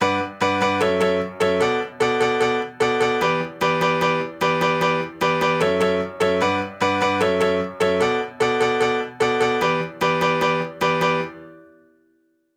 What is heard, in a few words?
Piano 150 bpm B minor